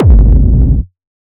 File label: TECHNO EXTREME RUMBLE FULL KICK; TECHNO PARADISE